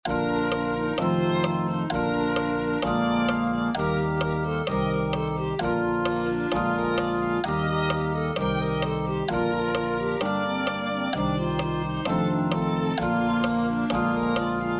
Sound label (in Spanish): violin2